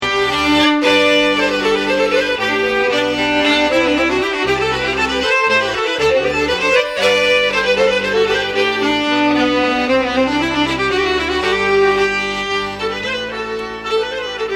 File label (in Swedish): polska; fran odense